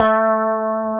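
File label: A 3B, compressed Strat, guitars; Spectrasonics; Hans Zimmer Guitars VOL-1; 90's Sample CDs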